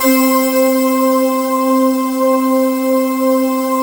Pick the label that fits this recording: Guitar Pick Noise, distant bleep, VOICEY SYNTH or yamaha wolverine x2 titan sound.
VOICEY SYNTH